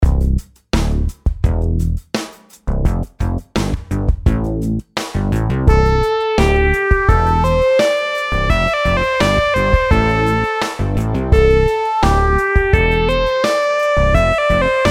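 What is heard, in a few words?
melodies simple 8s triplets C major; melody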